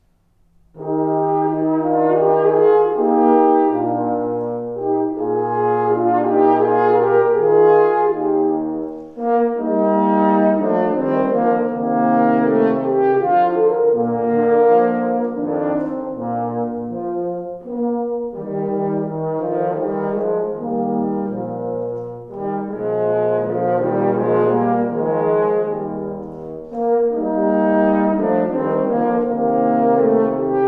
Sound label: Trio Andante; Mozart